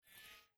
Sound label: button cancel mouseover